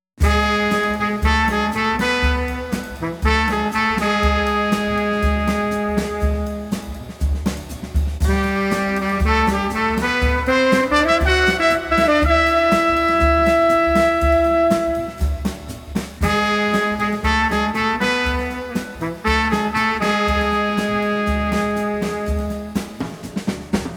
Street Brass Combo Chill 120

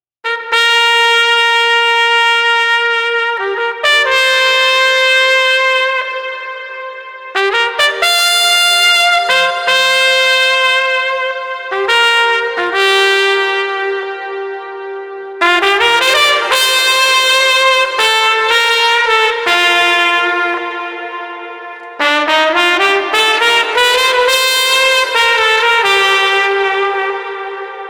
Street Trumpet Reflecting 110

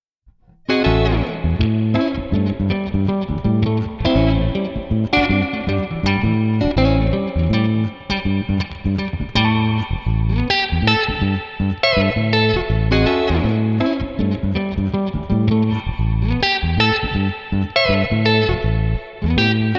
Street Happy Funk

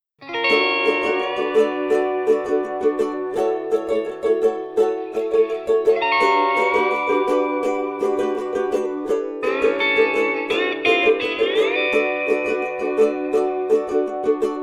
Hawaiian Steel Ukulele Positive 84

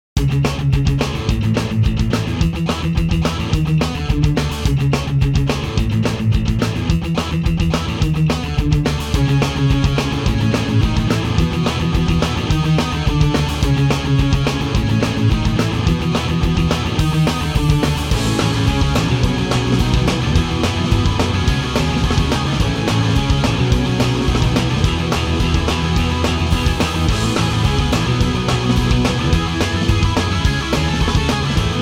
Pop Punk Instr

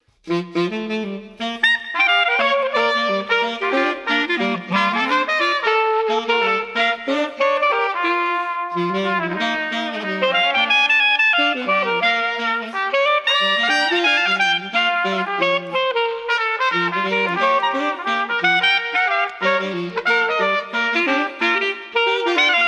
Street Brass Party 65

